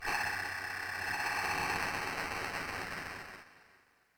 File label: fx, Glitches; polygoniix Intro Essentials pt2; Electronic; Firus fx